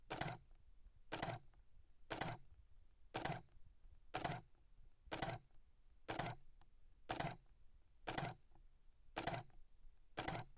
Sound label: Canon EOS 5D Mark III Silent Single